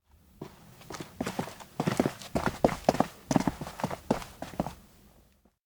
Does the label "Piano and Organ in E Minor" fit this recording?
No